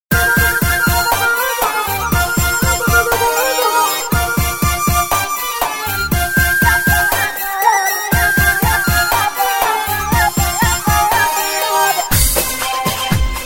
Trumpet

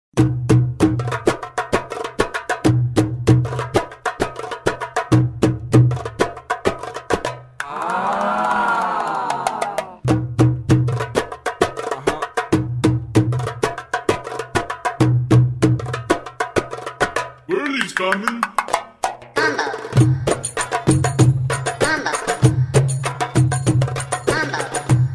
Combo, darbouka tabla; darbouka tabla vol 1

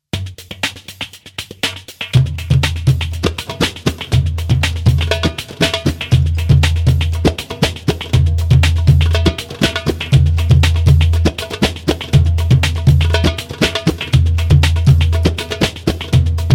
Darbouka Rhythm, oriental belly dance; Oriental Belly Dance Vol. 1(Darbouka & Tabla 2 Vol. 2)

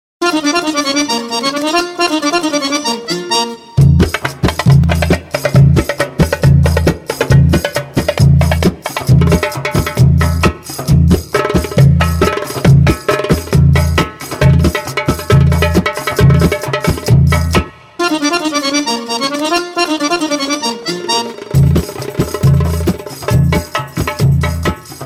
Tabla Style, Oriental Belly Dance

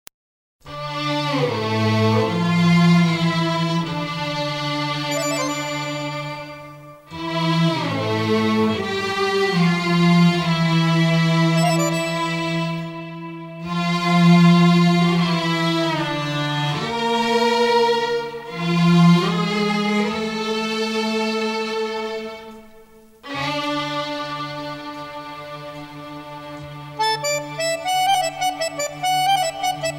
Oriental Lady, belly dance

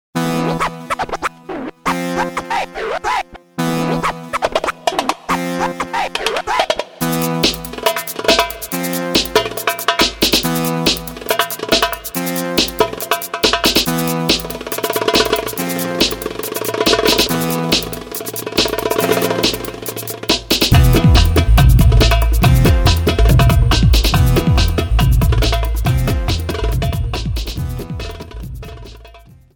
Tichno Darbouka, Oriental Belly Dance

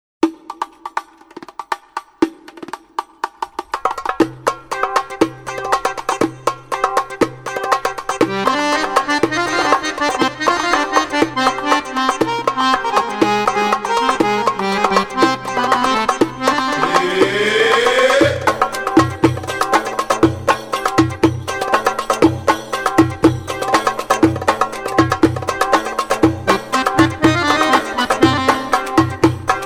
Orientals, belly dance

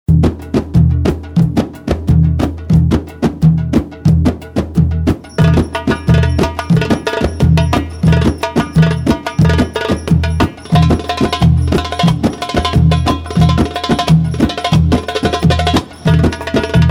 Only Darbouka, oriental belly dance; Oriental Belly Dance Vol. 1(Darbouka & Tabla 2 Vol. 2)

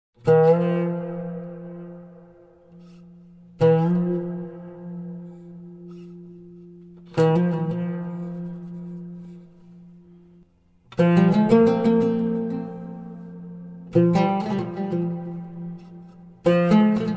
Asalaa, taksim oud

